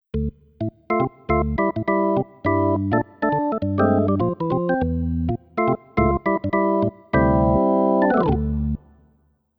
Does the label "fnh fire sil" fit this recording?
No